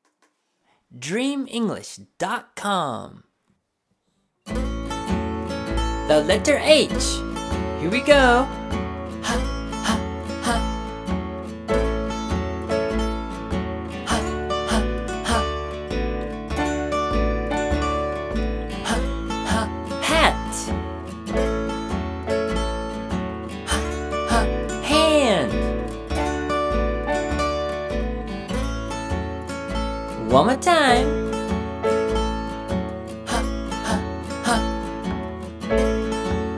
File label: h song